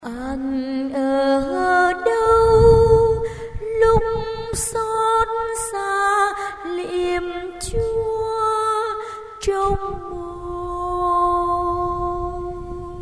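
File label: soprano tenor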